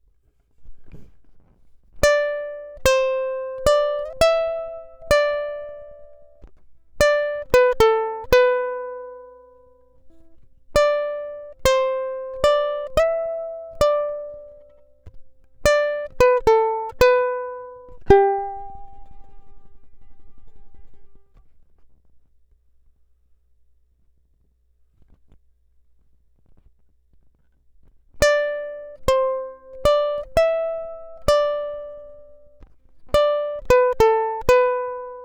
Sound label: e. gtr